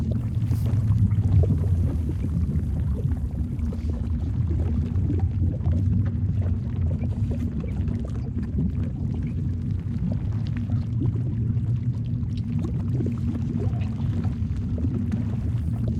Wasteland Loop

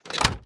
Switch Lever-Down

